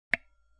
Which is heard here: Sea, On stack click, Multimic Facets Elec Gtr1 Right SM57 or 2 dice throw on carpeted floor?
On stack click